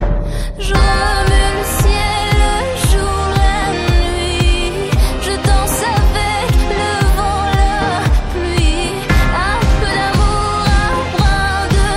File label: french ringtone